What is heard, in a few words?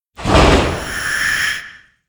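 caterpillar spawn, monster; Senillinea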